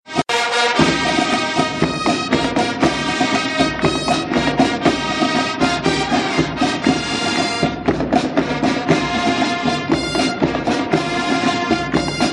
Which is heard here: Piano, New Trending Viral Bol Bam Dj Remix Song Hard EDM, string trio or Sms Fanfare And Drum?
Sms Fanfare And Drum